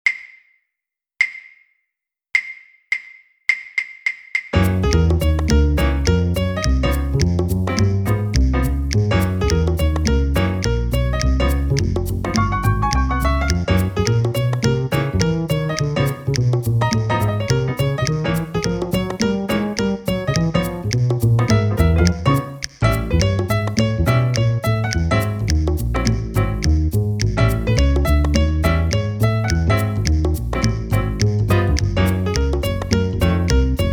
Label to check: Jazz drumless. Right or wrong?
Right